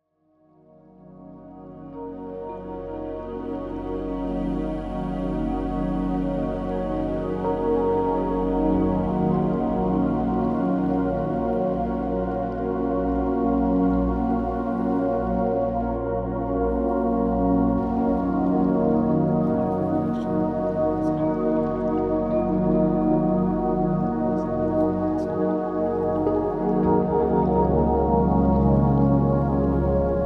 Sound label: Love Frequency Soundscapes; TwinFlameGuides